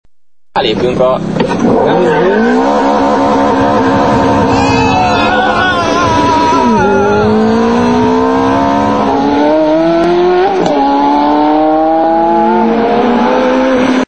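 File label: Ferrari F430 rev limiter; rupteur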